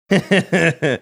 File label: chuckling